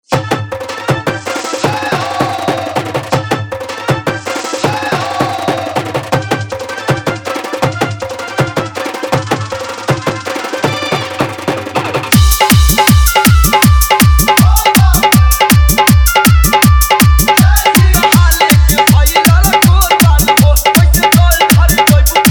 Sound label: Bolbam Dholki Mixx